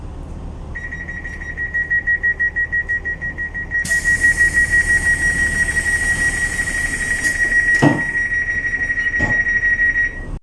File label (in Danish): MF Dørlukning; 28. august 2021, Knabstrup St. i MF 5017; Mads Bertram Liisberg